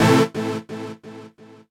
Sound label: KEY SHOT TEKNO 140 A; Techno2; Singleshots